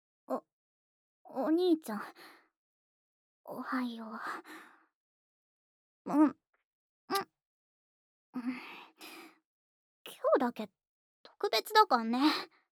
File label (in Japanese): 『おはようのチュー お兄ちゃん ver』